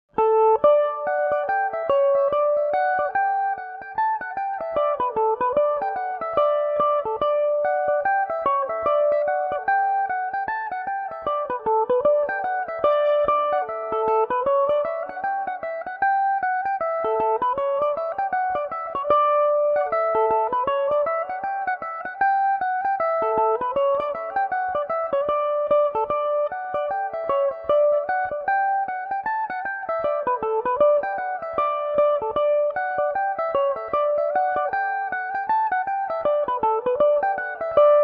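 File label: D major, Irish mandolin; marys dream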